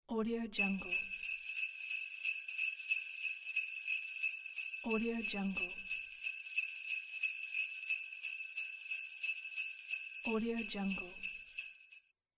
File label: sleigh bells